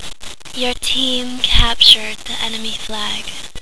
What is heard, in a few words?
team cap; mulch